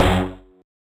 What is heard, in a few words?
marimba steeldrum